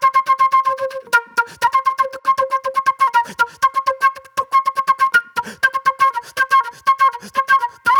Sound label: Super Soul Flute